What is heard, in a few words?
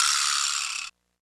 PRC XRATCH, Rappin Perc